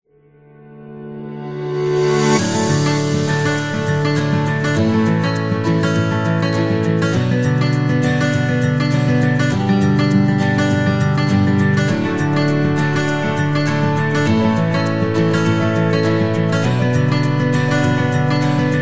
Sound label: Upbeat Positive Guitar; happy music vol 5